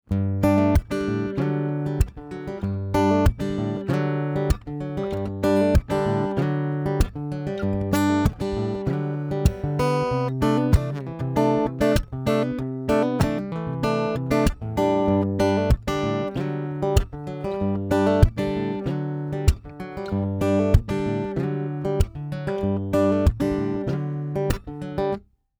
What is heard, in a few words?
Ibanez AAM700CE NT percussive undersaddle pickup